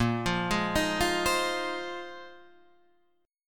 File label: A sharp 7th Suspended 2nd Suspended 4th